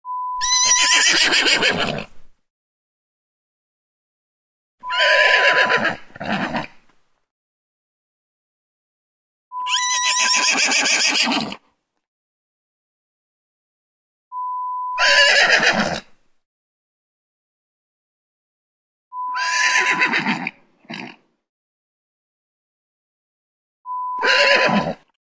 Horse neigh collection

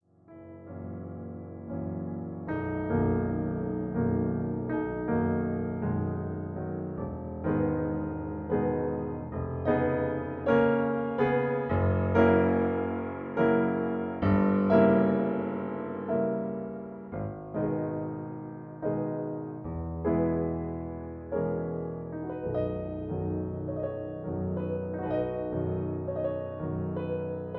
Accompaniment B; Dvorak Op55 No4

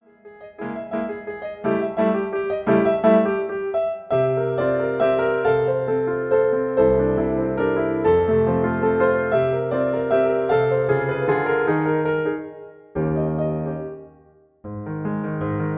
Accompaniment G sharp

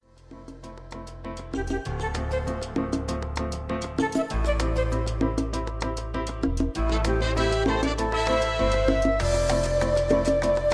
Salsa

